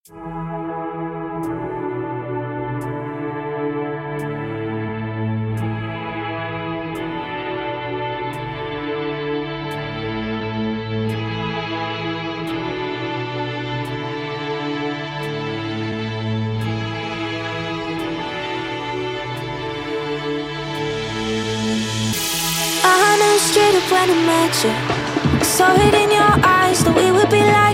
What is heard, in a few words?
drum bass; breeze summer edition 2023